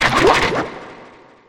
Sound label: cry